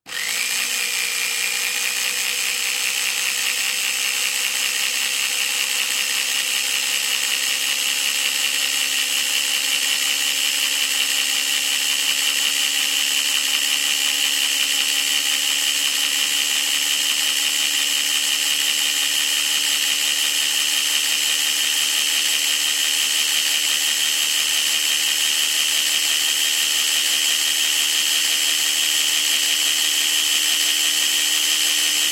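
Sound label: blender and juicer sounds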